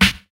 clear acoustic snare f key, snare drum sample tuned to the F note; snare drum samples